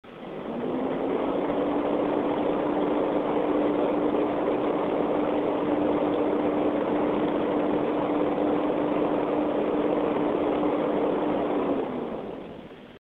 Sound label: spire sp420b8